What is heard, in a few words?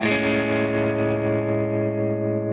Amaj7 Arpeggio down